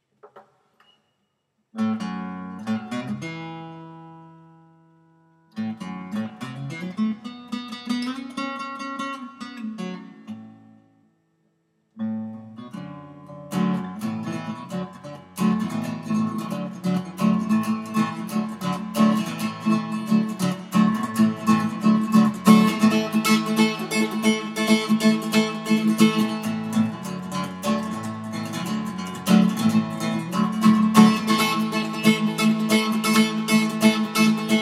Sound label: bluegrass